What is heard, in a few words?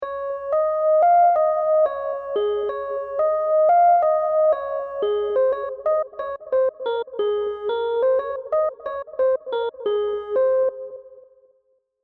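D flat 80 bpm, Soul MIDI Melody; Voyage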